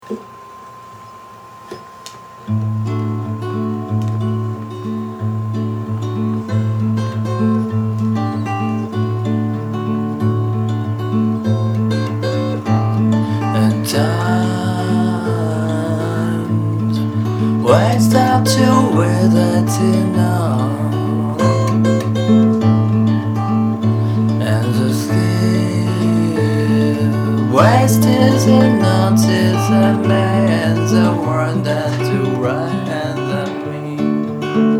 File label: guitar guitar; tonica